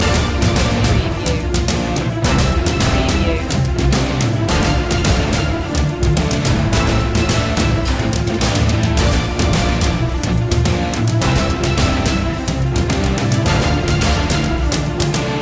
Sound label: battle in 8-bit space underscore